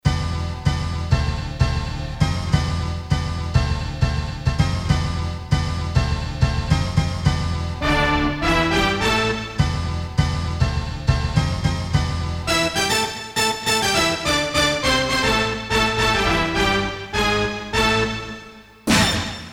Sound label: Band Hit